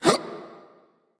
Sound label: grunt jump; Smite; bellona